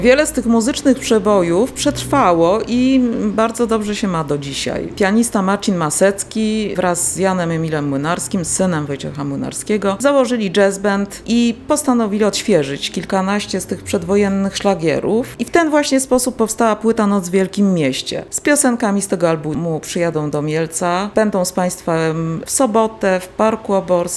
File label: jazz